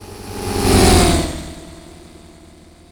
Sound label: Vehicle Fly By S-Shape Short 100MPH, Sci-fi; David Dumais Audio; Sci-fi Flyby Sfx Pack